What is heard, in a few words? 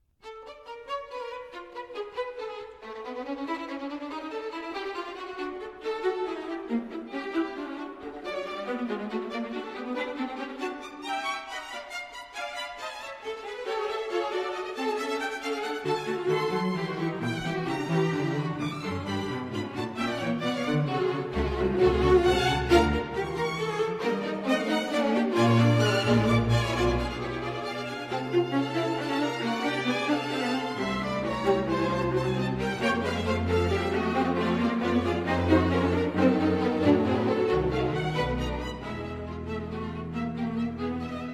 serenade fugue; reinecke